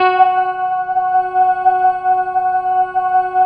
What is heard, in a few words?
SYN MORP, Roland; Sound & Vision - Gigapack II